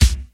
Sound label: short kick drum sound c sharp key; kick drum samples